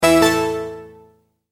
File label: multimedia tone verified